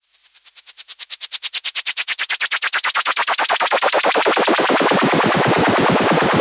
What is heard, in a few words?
Modular, Filtered Noise; noise hiss crackle